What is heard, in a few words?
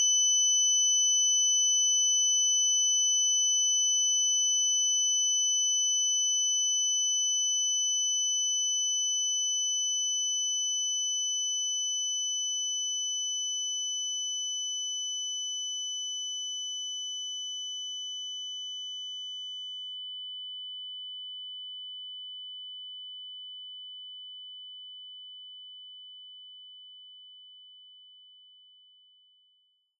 pitch Wine; DayZ Epoch; effects